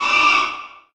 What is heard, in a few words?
wraith; kult